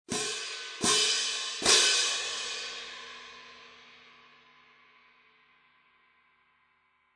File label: Artisan Traditional Symph Med Hvy, cymbal; 19"; Artisan Traditional Symphonic Medium Heavy; hand cymbals; Sabian